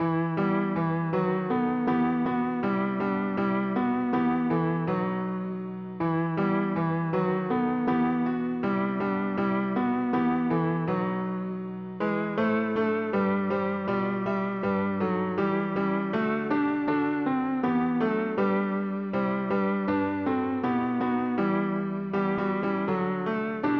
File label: God rest ye merry Gentlemen Tenor; Kerstmuziek; Langzaam repetitie tempo